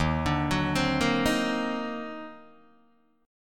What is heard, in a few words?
D sharp Minor Major 13th, Guitar, DADGAD; arpeggiated